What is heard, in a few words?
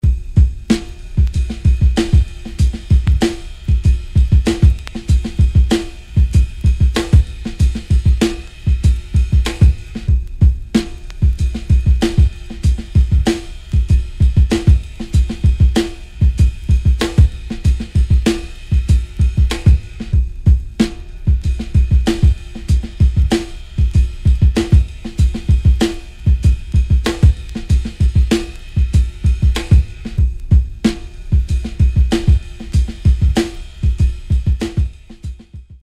Drum Loops 97 BPM